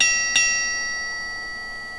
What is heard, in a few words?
CHIME, SHIP BELL